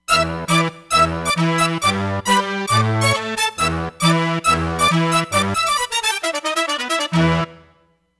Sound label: Hybrid Brass, Roland Fantom XR